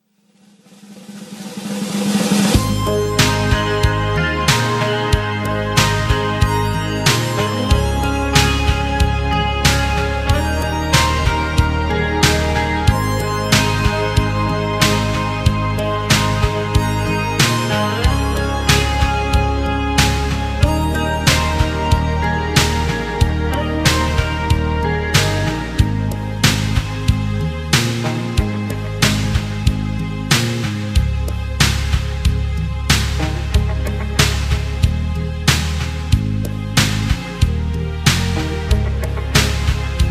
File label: Ab No Backing Vocals, backing tracks